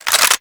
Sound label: Clip In; Warframe; Lex